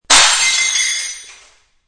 Plate breaks and shatters on hard floor.; Kitchen (Sound Effects:Household)

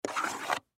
Chap Stick Slide Across Table; Foley; Slides; Sound Effects:Objects; SoundEffects-Blastwave

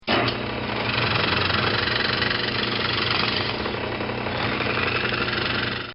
Drill Press Drilling Into Wood; Tools; Drills; Bobby Cole

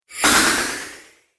Magical Impact Accent; Magic Sound Effects

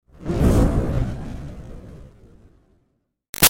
Fireball Whoosh Burst Huge Phasey; Whooshes; Sound Design; BigRoomSound

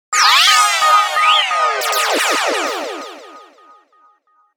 Logo Laser Warble Blurps; Sonic Logo; Blastwave

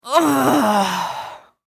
Human Female Grunt; Grunts (Sound Effects:Voice)

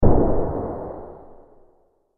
Dinosaur Mighty Footstep

